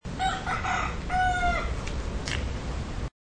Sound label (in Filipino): pagtilaok